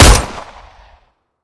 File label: weapon; CZ-75